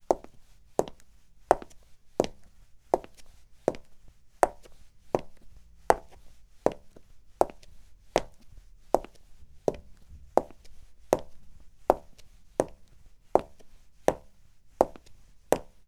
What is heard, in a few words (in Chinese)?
高跟鞋-人类行走